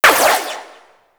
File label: Shot, Double Barrel Shotgun; Warframe; Weapons; Tenno; Tigris Prime